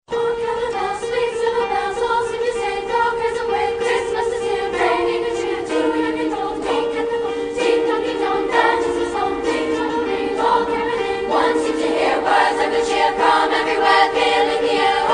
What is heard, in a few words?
Carol Of The Bells singing ringtone